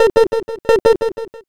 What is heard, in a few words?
frog